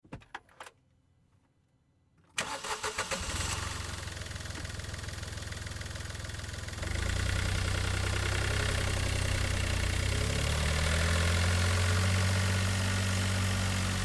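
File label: Car Smart Car Onboard Drive Short Move; 2005 Smartcar Fortwo; Vehicles; Car Smart Car Ob Drive Short Move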